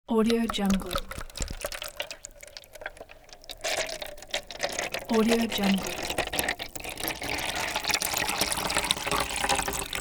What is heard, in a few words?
long drink pour